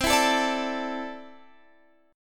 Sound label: D Suspended 2nd C; D2/C strummed